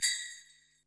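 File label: Drum Analog Kit Jingle Bell, Yamaha RM1X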